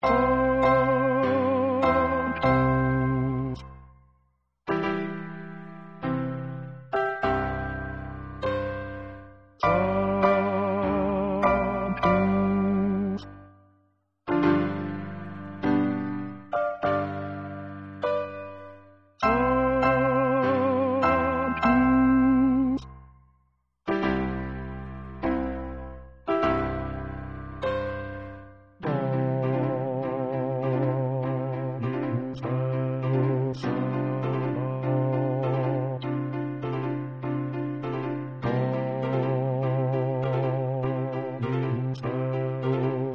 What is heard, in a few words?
Sanctus Basse; Mozart Kv427